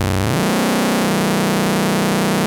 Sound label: Monotron Sci-Fi